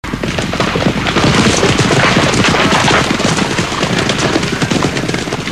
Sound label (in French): Chevaux troupeau